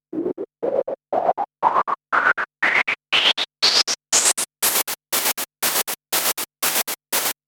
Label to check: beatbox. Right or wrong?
Wrong